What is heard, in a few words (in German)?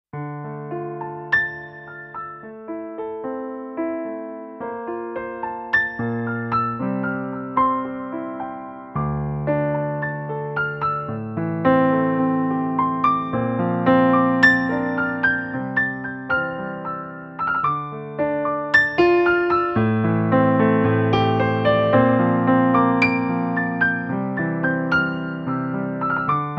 weihnachten am piano